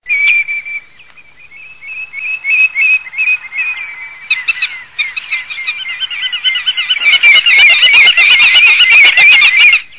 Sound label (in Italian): PITTIMA GAMBETTA, birdsound